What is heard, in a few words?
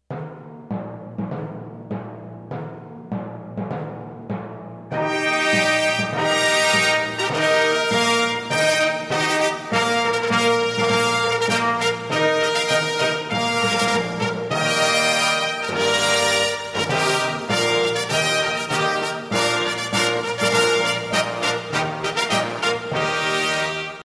Fanfare